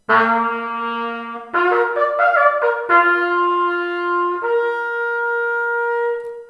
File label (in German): linker fluegel, jagd